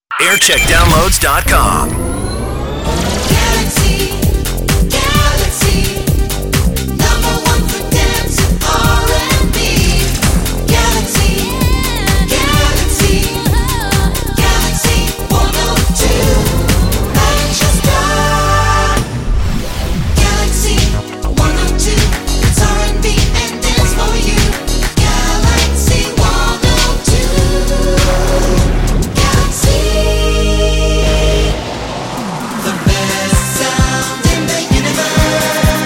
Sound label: jingle montage; galaxy 102